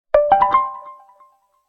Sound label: notification